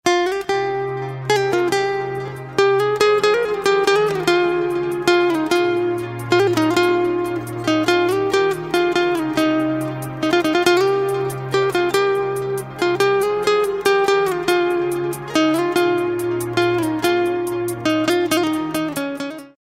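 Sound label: guitar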